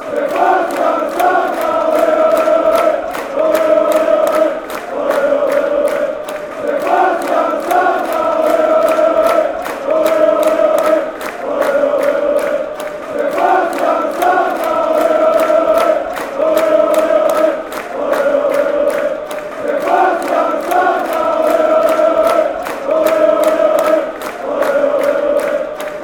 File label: chant, fanchants; aek athens